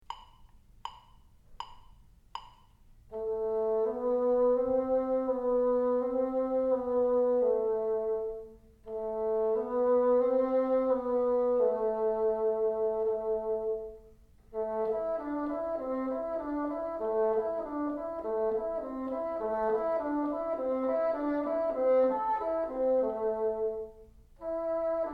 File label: teacher, bassoon